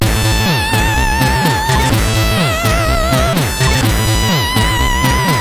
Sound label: X-Music Remix, CSG8580